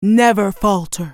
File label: spell cast effort; Karma; Character Voices; English